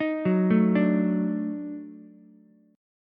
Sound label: D sharp Minor Major 7th Flat 5th D sharp Ukulele; arpeggiated